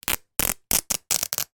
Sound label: cloth polyester running shorts rip; sound effects one